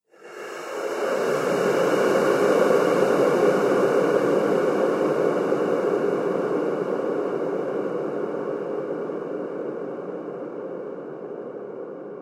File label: Zombie Ambience Drone; horror; Zomby